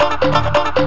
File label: Trance, Drums Beats